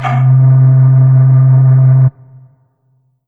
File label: Ensoniq ZR-76 Bottle Blow C3